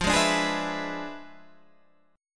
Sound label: E 7th F, guitar; strummed; chord; JGuitar